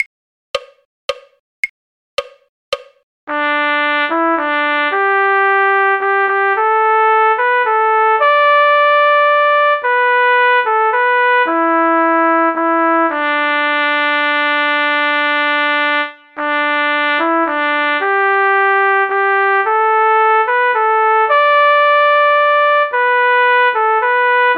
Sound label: trumpet; Skye Boat Song; folk